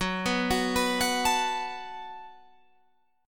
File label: Gb Minor Double Flat 5th, Guitar Open G